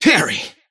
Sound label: parry success; dota; kez